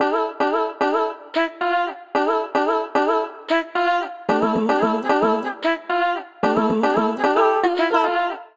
Sliced Melodies D Minor 112 bpm, Harmonic Racks, Voice Box; MIDI Clips; Ableton; Factory Packs